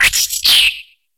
silverfish death; mobs; Mineclonia; mobs mc

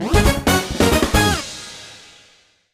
correct Jingle, quiz Show; HeavenStudioPlus

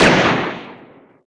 Launch Robot; HeavenStudioPlus; working Dough

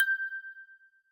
note, tambourine

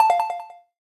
correct; Heaven Studio Plus; quiz Show

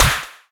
both Orange Catch; HeavenStudioPlus; catchy Tune

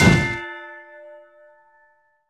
miss; Airboarder

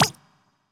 flip; HeavenStudioPlus; flipperFlop

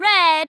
tap ready; HeavenStudioPlus; tapTroupe

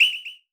whistle; Splashdown

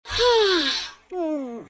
Falling Asleep; sm64coopdx; char-select-extra-chars; yoshi

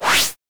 signal; Heaven Studio Plus; nail Carpenter

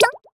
quick; HeavenStudioPlus; catch Of The Day

